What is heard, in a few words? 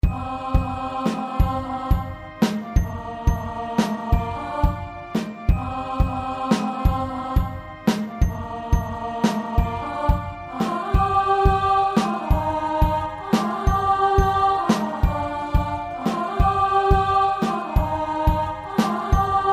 Burn Harmony